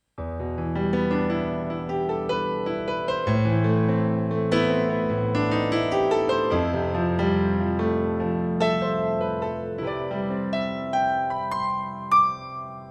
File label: Piano